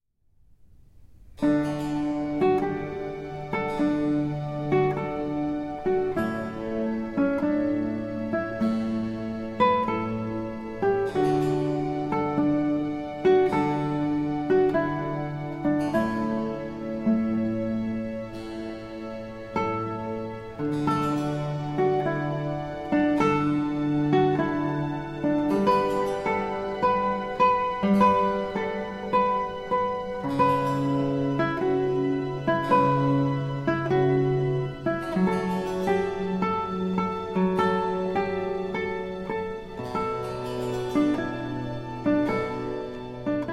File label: Concerto in D Major, Largo; Vivaldi; RV93; Arr. Siegfried Behrend